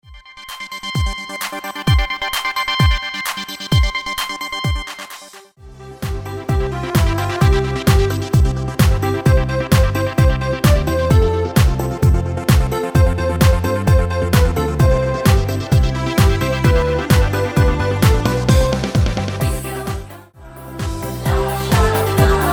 Remix Ballo Di Gruppo Uomo Canto

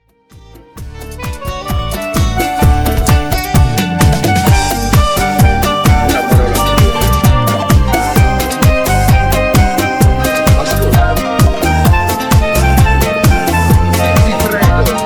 Bachata Remix Ballo Di Gruppo Con Voce Canto

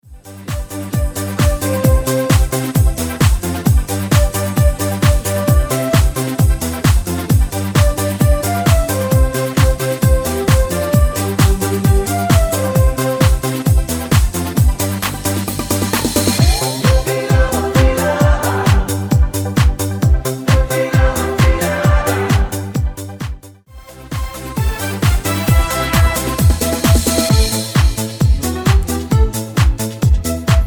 Medley Ballo Di Gruppo; Canto

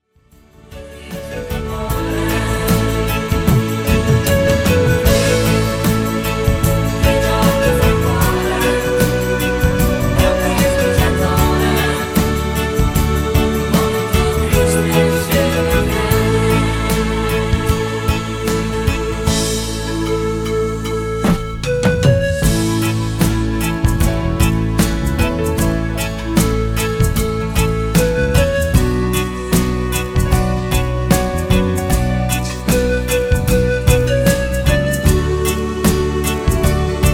Moderato Fox Canto

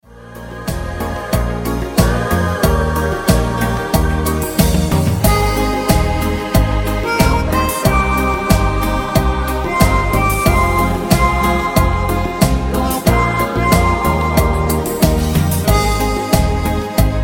Cumbia Canto

